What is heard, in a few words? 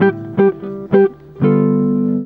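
JAZZ GUIT GROOVE; Best Service; World Colours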